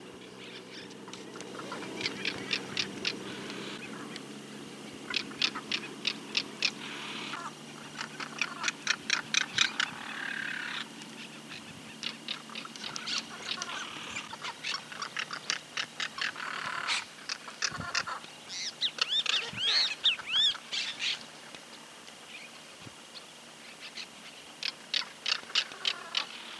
carancho voz, aves

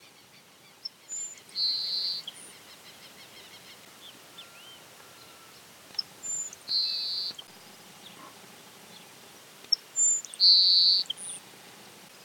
cachilo ceja amarilla voz; aves

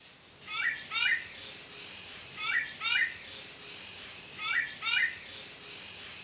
zorzal colorado voz; aves

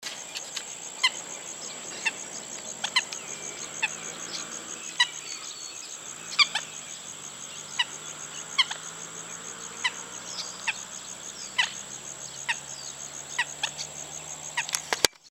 Voz pollona negra; aves

